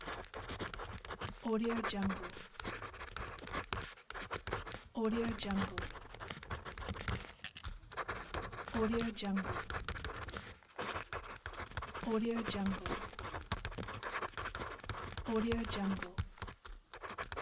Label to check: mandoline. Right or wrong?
Wrong